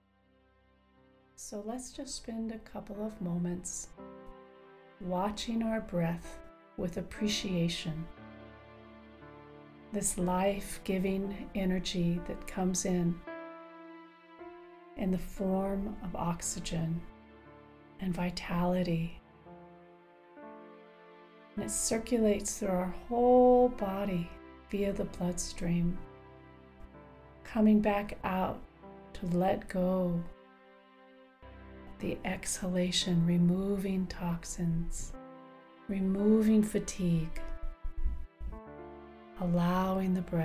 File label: Deep Relaxed; Golden Life Healing